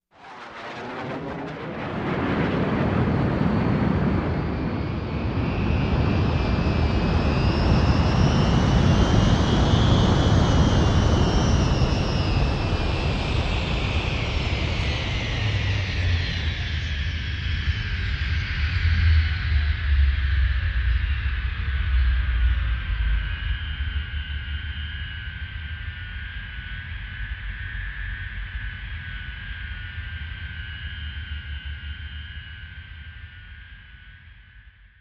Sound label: Rocket Takeoff Land, take-off/land